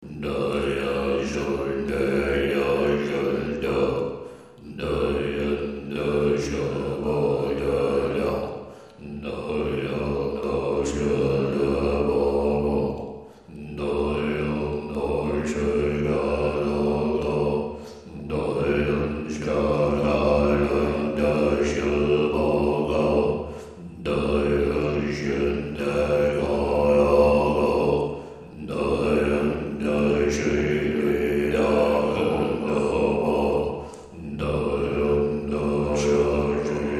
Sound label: Tibet chant